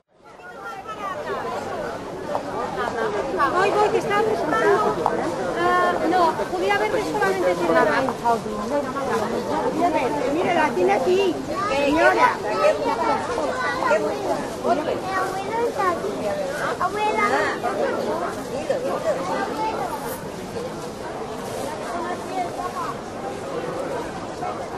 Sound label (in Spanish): Mercado Exterior De Frutas Y Verduras; Fontan; oscardeavila